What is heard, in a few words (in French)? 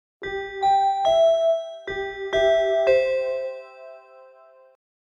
SONNERIE; pySonnerie